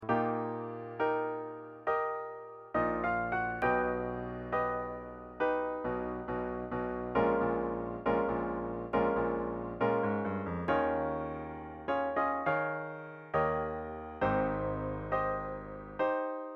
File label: Piano